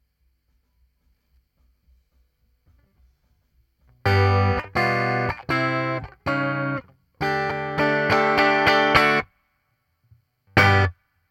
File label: GTR, RNR for piano